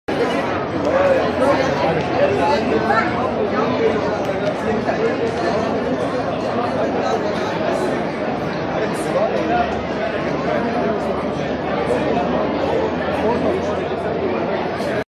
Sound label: Arab crowd; همهمه عربی